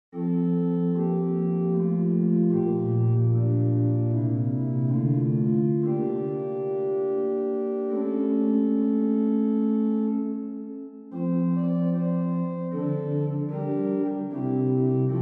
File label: Messe Noel, OrganMusic; Fessy & Mine; Souvigny